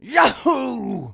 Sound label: yahoo; foreman spike; SM64 CO-OP